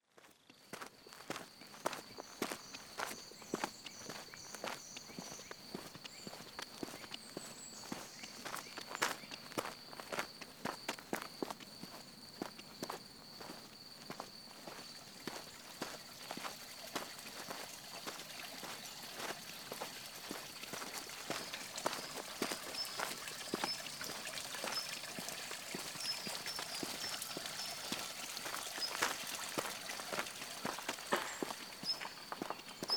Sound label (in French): melodie oiseaux; jaujac